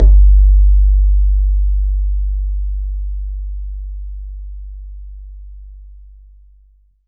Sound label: BAYAN; Q Up Arts; Denny Jaeger Private Collection